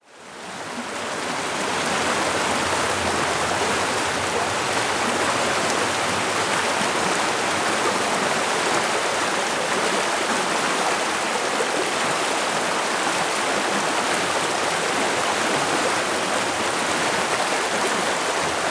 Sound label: Rushing Stream